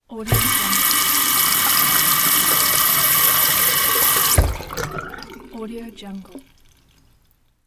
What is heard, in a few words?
water faucet on off